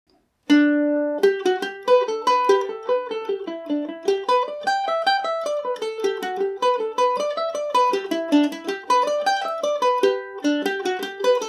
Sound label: jig gmaj, mandolin